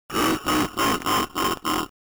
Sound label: boss laugh